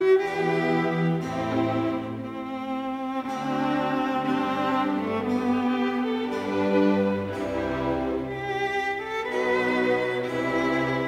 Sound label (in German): konzert fuer viola und streichorchster g dur largo